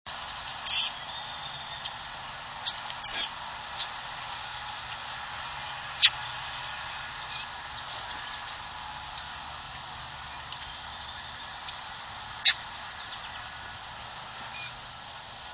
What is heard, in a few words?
mockingbird alarm call; Collegeville, PA, 7/20/05, 12:00 p.m.